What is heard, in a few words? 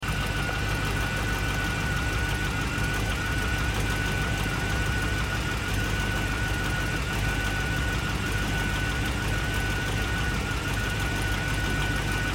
quiet engine knock engine; discovery ii